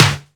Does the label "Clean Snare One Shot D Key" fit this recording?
Yes